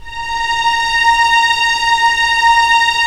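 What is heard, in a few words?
string section; Roland - LA Composer vol. 1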